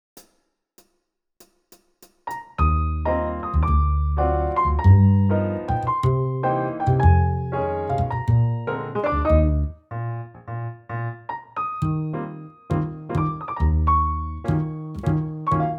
minus drums

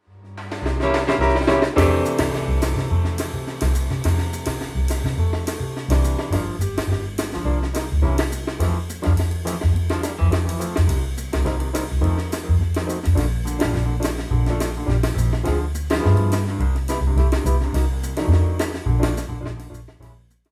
bossa nova minus sax

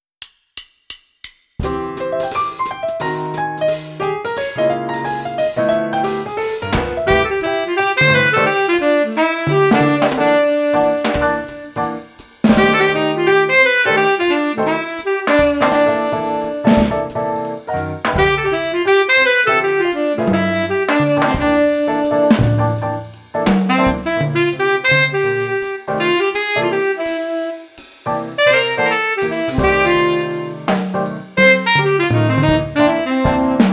minus bass

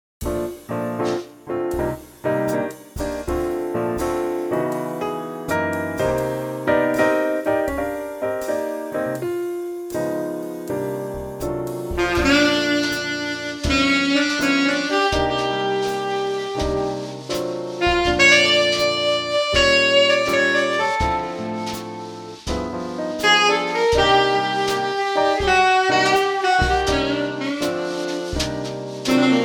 minus bass

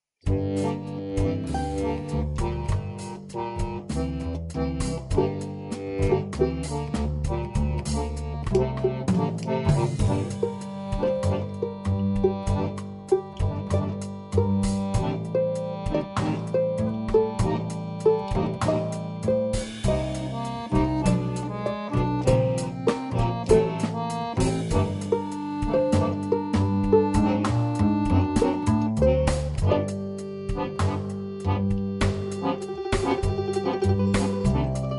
back

